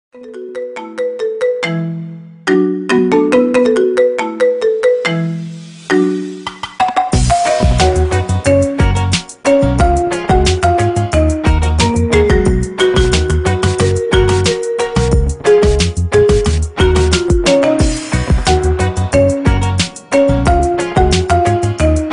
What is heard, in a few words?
marimba ringtone remix